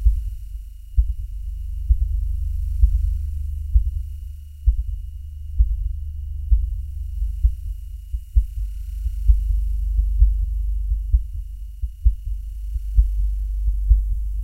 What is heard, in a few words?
Stress; MiSide